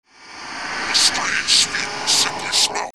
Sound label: SCIFI